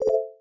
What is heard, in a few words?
Wireless Charging Started